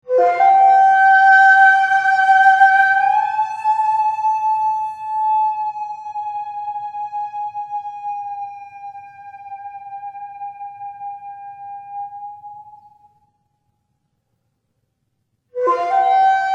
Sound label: tsuru no sugomori shakuhashi the japanese flute